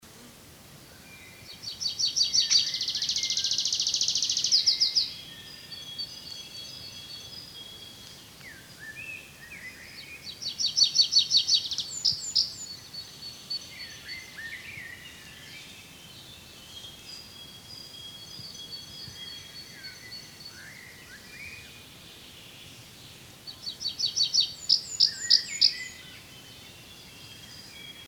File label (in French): pipit des arbres, chant